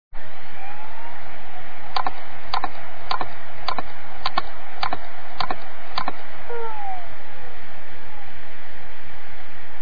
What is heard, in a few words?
samsung clicks spins down